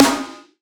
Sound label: Acoustic Drums - Snare; Heavy Acoustic Drums; Antidote Advent